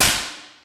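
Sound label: physics clipboard impact large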